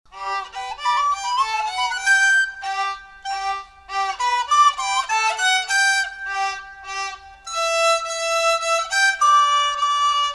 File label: Minuet For Recorder & Violin; J.S. Bach